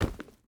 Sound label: footstep rubber boots forest jump land; Rust